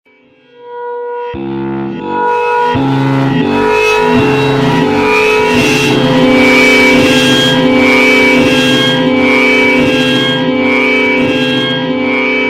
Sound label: mid noise; scary sounds